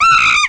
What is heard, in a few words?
SCREAM; duke nukem total meltdown